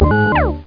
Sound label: Bleep Ow